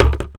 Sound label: block dug; minetest; colorcubes